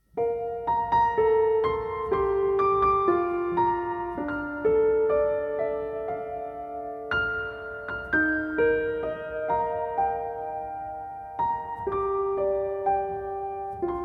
Piano and Cello Duet

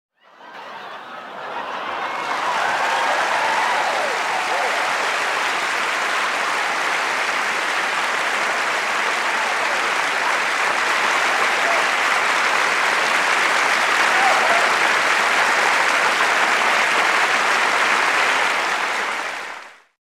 Huge Interior Crowd Applause w Laughter & Cheers

